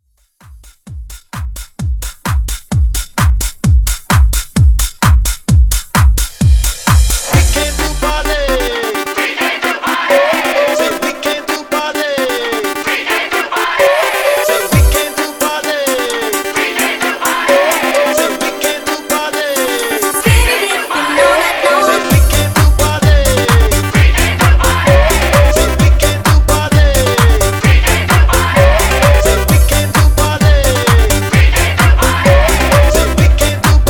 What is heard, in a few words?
Hype